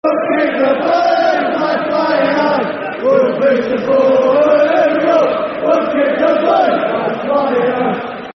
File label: Football Chant, Soccer Songs; Keep The Blue Flag Flying High; Oldham Athletic Oafc